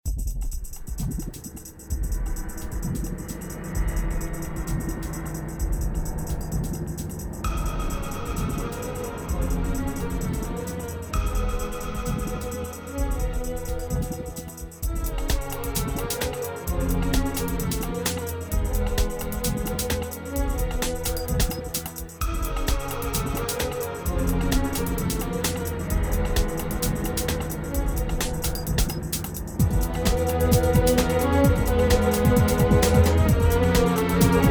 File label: Long Sweet Melody